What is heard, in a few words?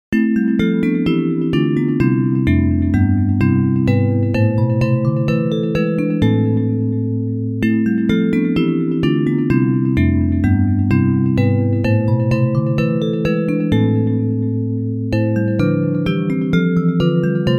Bells